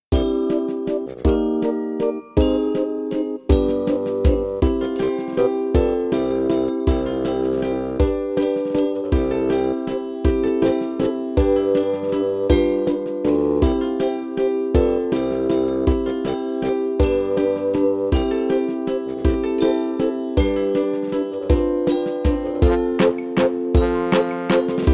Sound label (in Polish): rozesmiana choinka instr mix